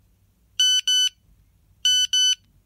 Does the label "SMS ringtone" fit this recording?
Yes